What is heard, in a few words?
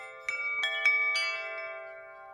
chimes